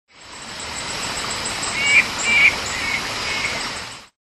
Boophis brachychir

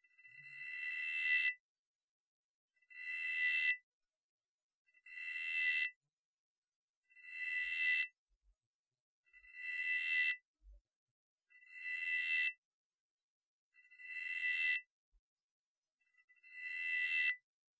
Xenopus andrei

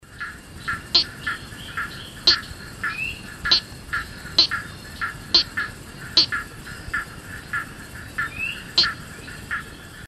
Gephyromantis moseri

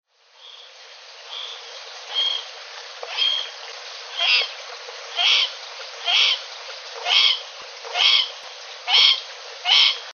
Gephyromantis corvus

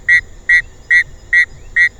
Adenomera martinezi

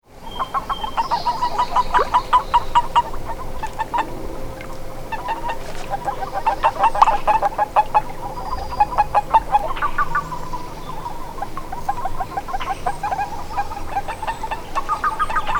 Anaxyrus boreas boreas

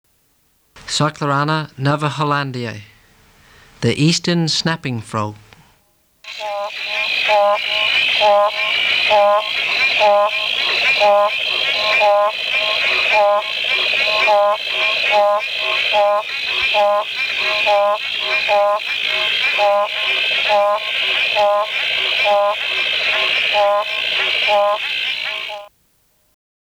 Cyclorana novaehollandiae

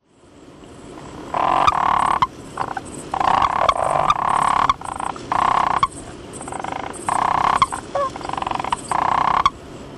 Boophis albilabris

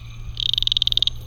Physalaemus bokermanni

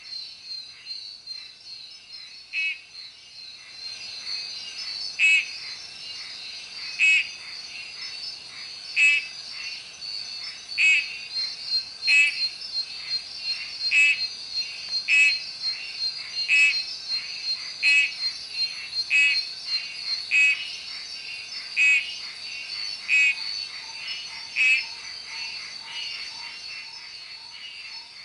Oreophryne anser

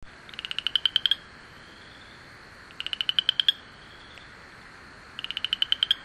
Pseudacris fouquettei

